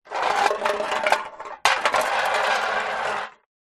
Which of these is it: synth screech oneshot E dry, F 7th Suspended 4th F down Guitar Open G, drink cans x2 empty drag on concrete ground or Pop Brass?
drink cans x2 empty drag on concrete ground